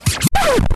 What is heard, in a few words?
lose; hellbound